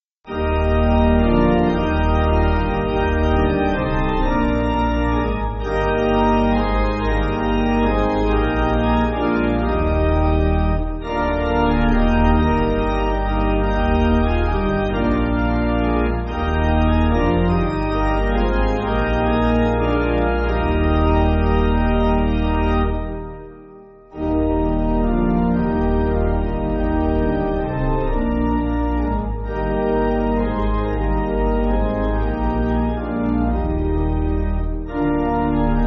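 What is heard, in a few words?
Pipe, Pipe Organ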